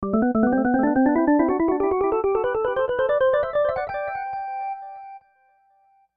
Organ Delay Ascend, Organ Delay Ascend Maj; Keyboard; Musical; Blastwave

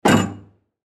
Ship Door Hit Reverb

